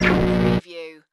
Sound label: Glitch